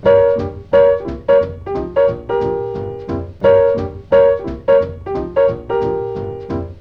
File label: Piano Loop